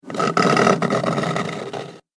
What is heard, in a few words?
Horror Scratch Wood; Wood Sound Effects